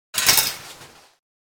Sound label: Shower curtain sliding close